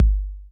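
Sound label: analog kick; 90 sSampleCDs; Crypton - DrumBase5000; ANALOG KITS1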